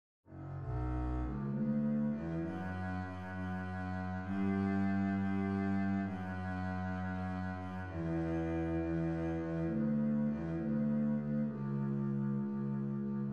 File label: bass, string bass; moldau